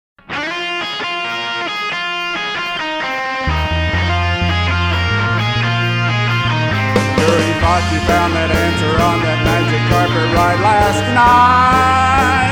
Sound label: Kicks